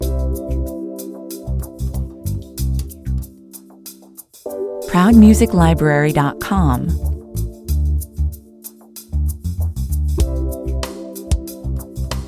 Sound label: on hold 94bpm